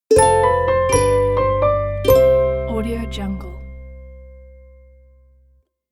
positive logo

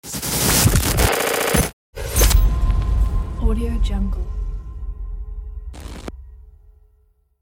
glitch logo

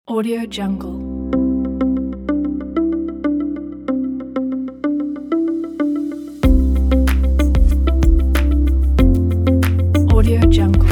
a simple corporate

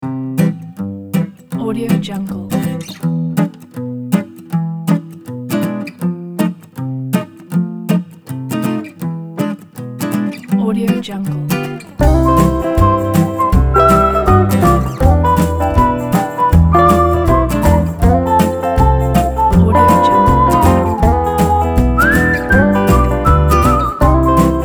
country jazzy swing

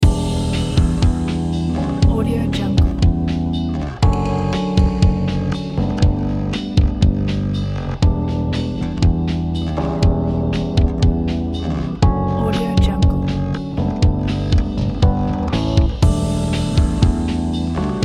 romantic pop; 11thelement

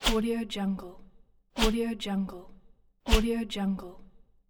light swipe

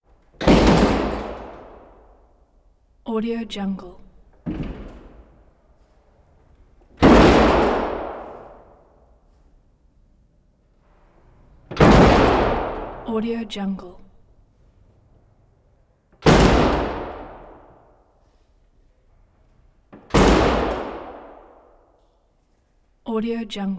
safe door closes big metal door slams rattle; prosoundfx

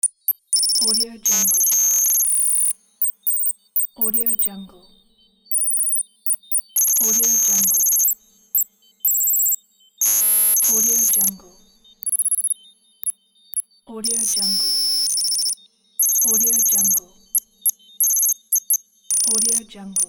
hitech cybernetic device

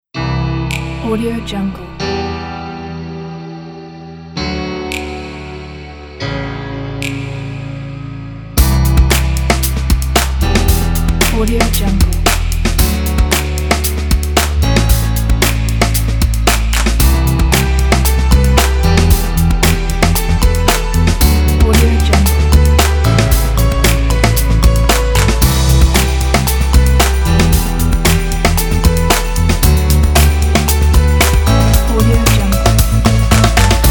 inspire

